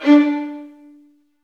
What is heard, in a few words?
STR VNB2, Vlns Marc; Roland L-CD702; Vlns Marc1-3